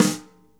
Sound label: snare